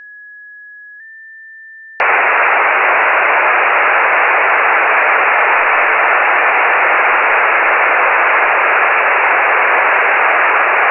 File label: with guidetones